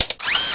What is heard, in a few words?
look